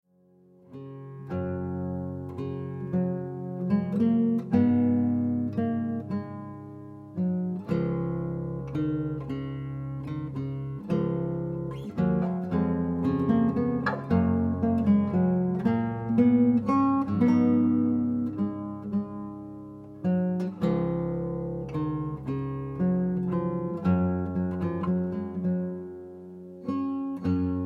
guitarscape

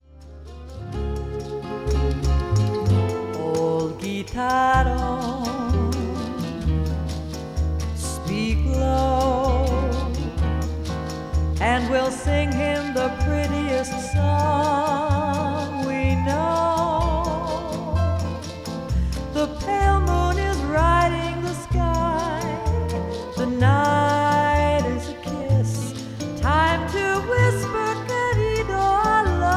guitar

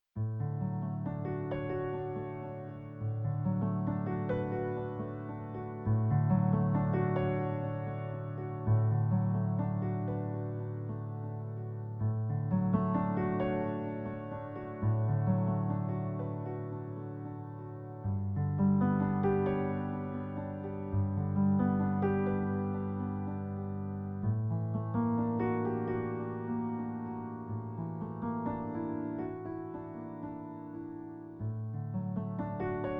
piano

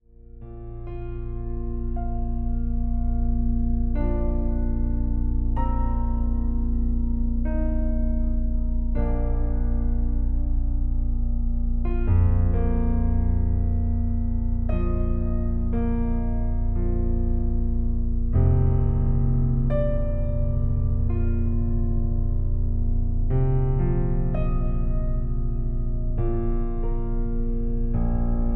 piano

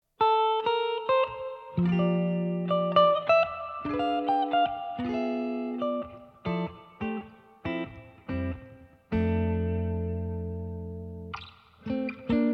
two guitars

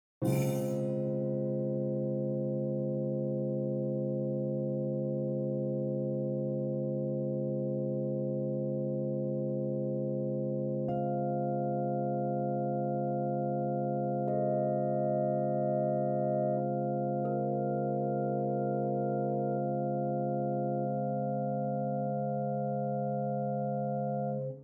silent rain

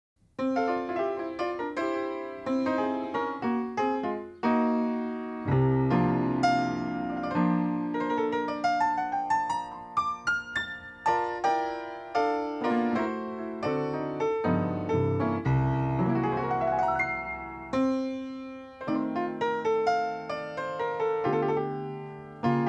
duet

